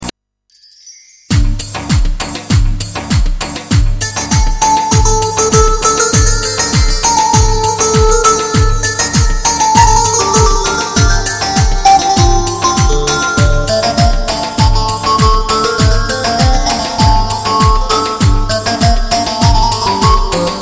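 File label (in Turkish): GW8 elektro leslie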